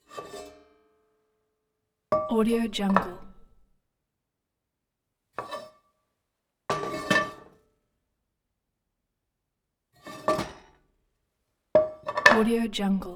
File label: frying pan onto stove